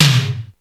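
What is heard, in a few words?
tom low; xr10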